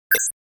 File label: computer bleep; NCH Library